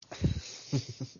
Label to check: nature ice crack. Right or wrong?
Wrong